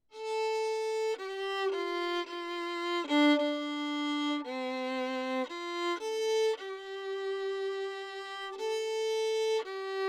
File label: Little Gem Hyper Cardioid Violin Lyric 24cm from F hole